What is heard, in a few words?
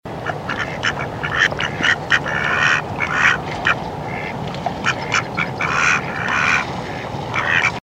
Mallard